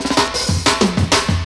fills; Sound & Vision - Gigapack I

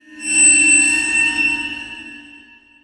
Waterphone Shine; Sonic Foundry (Sony Creative Software); The Electronic Music Manuscript Collection

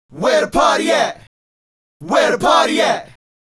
Male Group, Vocal

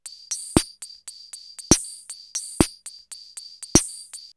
CR78 BeatBox, drumloops, DrumMachines; X-Static Goldmine

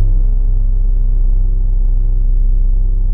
BASS, Drum & Bass 160 BPM

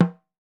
NIGERIAN, African Perc; E-MU Formula 4000 Series Vol. 4; Earth Tones

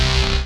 Dance Mega Synth; Best Service; 90 s Sample CDs

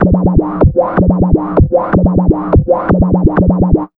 125 DRM, Fresh Disco House

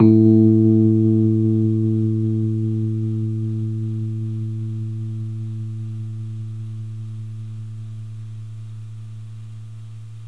RHODES SLO SO, 3D Keys; 3D Sound; 3D Keys VOL-1

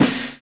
DANCE SET, DRUMS

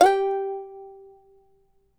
charango G3; Spectrasonics; Hans Zimmer Guitars VOL-2

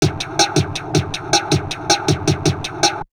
DIST, Drum Loops Crazy Processed

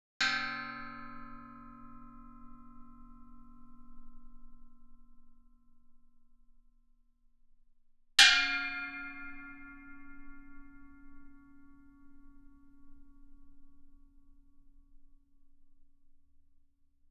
Gamelan; Propeller Island - Complete Gamelan

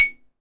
XYLOPHONE, Percussion; Synclavier; World & Orchestral; ILIO

